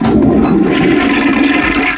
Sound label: FLUSHY